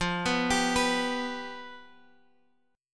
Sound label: F Suspended 2nd Flat 5th, Guitar, Drop D